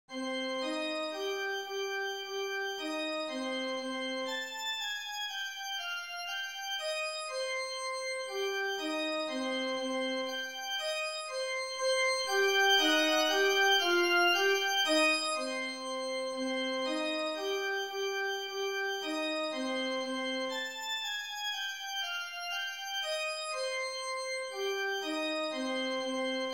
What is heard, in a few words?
It's October organ